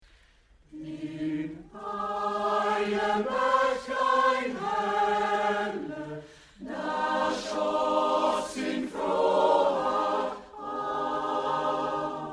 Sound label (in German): forelle Chor; forelle beethoven